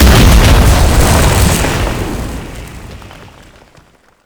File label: Lasrian APC Explosion; Enemies; Warframe